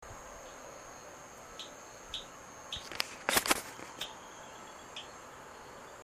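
eleutherodactylus lentus barren spot saint croix usvi; It emits a soft call which is seldom heard.; Virgin Islands; West Indian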